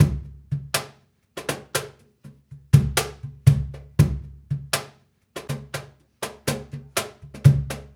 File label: Cajun, Percussion, Drums; Essential Percussion